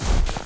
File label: snow place; everness